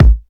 dry bass drum sound A key; kick drum samples

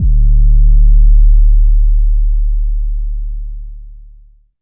phonk kicks oneshot 808 D; kick drum

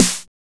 focused snare drum sample F sharp key

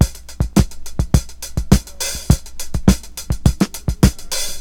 104 bpm drum loop sample b key; tuned drums

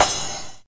verby open hat one shot f sharp key

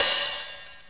long room reverb open hat one shot b key

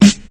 tight low end steel snare drum g sharp key

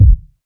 fat kick drum one shot e key

